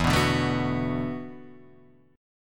E 7th Suspended 2nd Suspended 4th E down Guitar Drop D